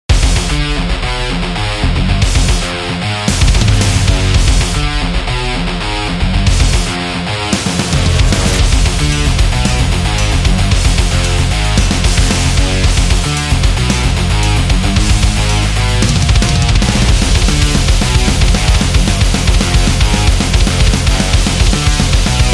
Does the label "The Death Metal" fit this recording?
Yes